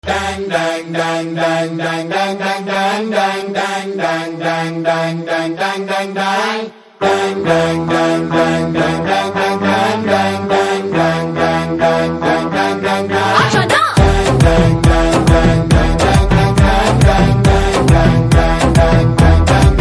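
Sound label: background music DJ Theme Telugu Ringtone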